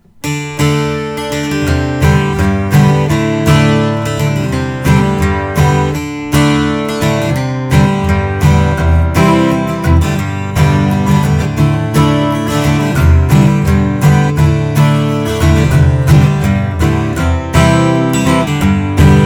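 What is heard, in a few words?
taylor 958 12 string tobacco sunburst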